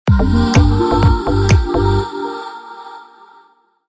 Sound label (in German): Soundlogo Elektro